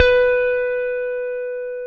JAZZ GT1B3; AKAI S-Series CD-ROM Sound Library VOL-8

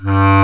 BASS CLAR G1, WIND

